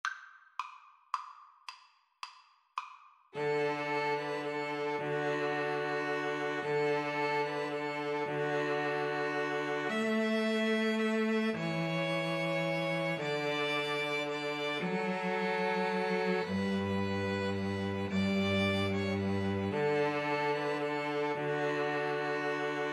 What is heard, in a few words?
silent night, String trio